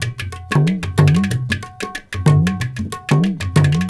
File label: India, Heart of Asia